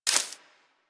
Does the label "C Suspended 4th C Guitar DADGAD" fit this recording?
No